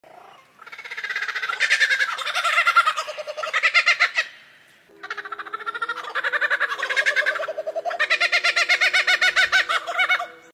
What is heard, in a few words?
Menura, پرنده